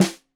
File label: Snare 65 Reg Stereo, Deeply Sampled Drums